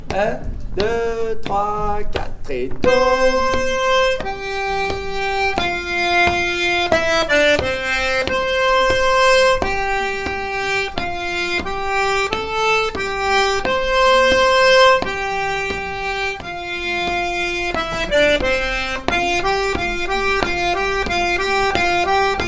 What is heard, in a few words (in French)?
Voix des violons